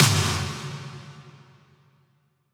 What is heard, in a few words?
Impacts, Effects